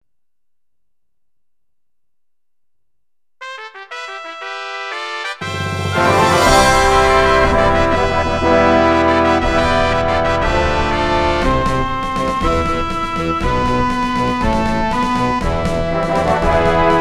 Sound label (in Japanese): ヴワルファンファーレ